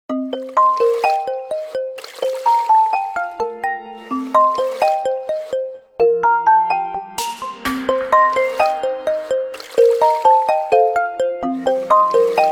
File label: Water ringtone; Nokia Ringtones; Audio themes